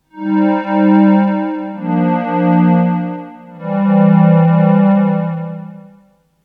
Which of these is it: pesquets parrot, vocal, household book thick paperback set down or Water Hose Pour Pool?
vocal